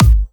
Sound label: Bassdrum; Vengeance Samples